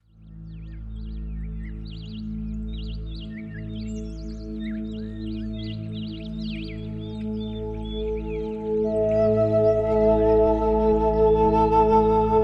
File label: Music With Nature